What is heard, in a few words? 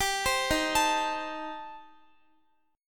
D sharp 6th Flat 5th, Guitar; D#6b5; arpeggiated; x,x,17,17,15,16; 4E,4A,5D,5G,5C,6F; chord shape